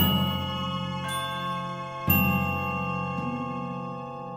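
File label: Orchestra Suspense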